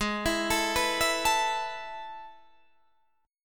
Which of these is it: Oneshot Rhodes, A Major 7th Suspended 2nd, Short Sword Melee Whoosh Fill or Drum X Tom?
A Major 7th Suspended 2nd